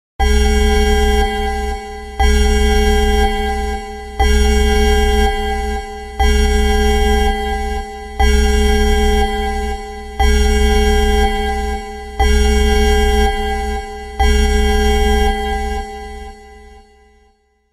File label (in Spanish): Alarma Planta Nuclear